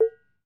BONANG, Bronze Gamelan

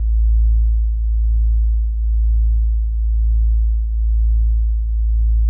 A1, synth basses; Music Store Sound Service; Einstein

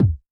BD Rave, Yamaha DTXpress-II Kick

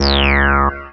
303 Bass Long; Mo' Phatt; Digital Sound Factory Mo' Phatt

